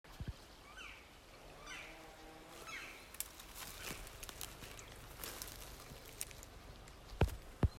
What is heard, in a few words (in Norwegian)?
fugler